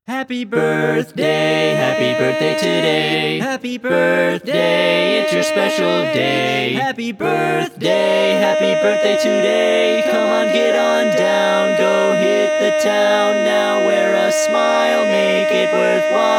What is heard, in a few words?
Happy Birthday Song in English Barbershop